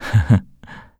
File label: laugh; Eternal Return; KOR